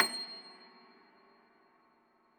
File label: Thumb Tack Piano ff +ped, C6; Best Service ProSamples vol.53 - Classical Prepared Pianos; Total Piano